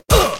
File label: hit; cpu